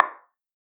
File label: objects